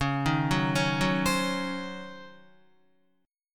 Db Major 7th Suspended 2nd Suspended 4th, Db, Guitar; arpeggiated; JGuitar